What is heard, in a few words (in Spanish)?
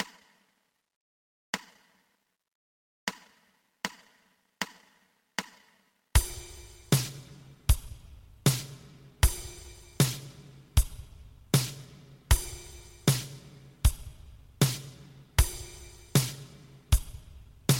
2 pulsos